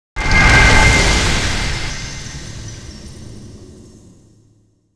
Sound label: divine titan fx